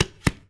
TABLA TIRA; AKAI S-Series CD-ROM Sound Library VOL-5

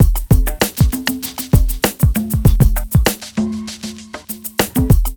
BEAT, Hip Hop; Grunge Hip Hop; USB Soundscan vol.34; AKAI; TIMBA

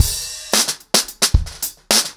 110BPM, acoustic drums, drumloops

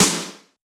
Yamaha DJX 2B, SD Elec M

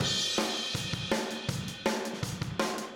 Room M, Drums, 162 BPM; Take Your Toms Off

